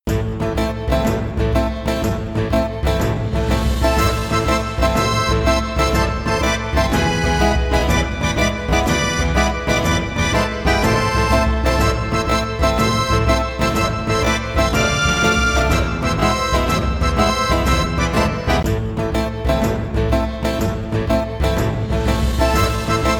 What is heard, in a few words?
searching for treasure karaoke